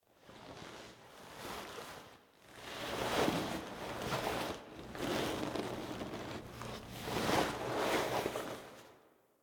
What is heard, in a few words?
Foley Operator Pickup; Warframe; Orbiter Nursery